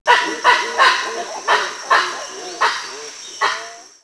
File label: baboon sounds; primate